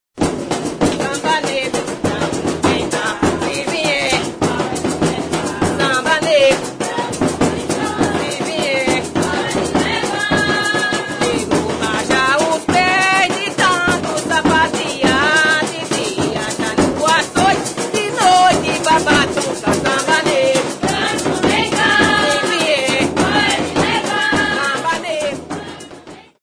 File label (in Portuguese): samba; cocos